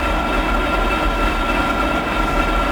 Machine Generator Loop; Machinery - Generators

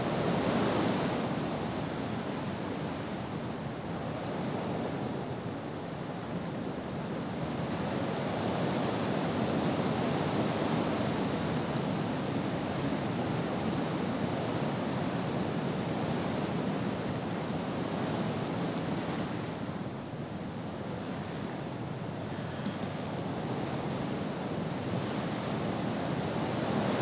hard wind; firefight

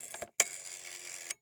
Telephone Rotary Dial; Communications; 1001 Sound Effects

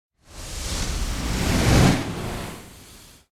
Dragon Flying Fire Movement; Hollywood Edge; sound designer's toolkit v2

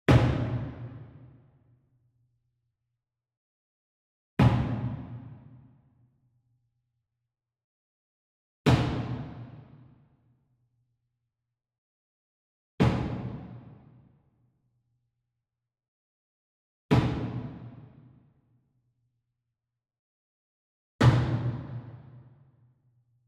Sweetener Wood Mallet Single Mid Hit Far; boom library; Cinematic Strikes Construction Kit

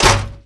Armour Stomp; SFX Kit; Creaks, Scrapes, & Misc

